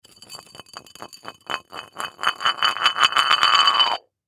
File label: Clay Pigeon Spin, Clay Pigeon Spin Cement; Miscellaneous Sports